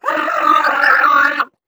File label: ballbot laser burn; player; Portal 2